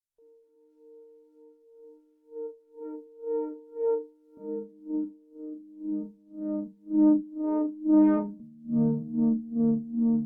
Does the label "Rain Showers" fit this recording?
Yes